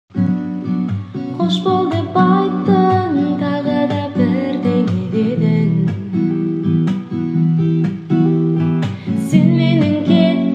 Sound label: cover gitara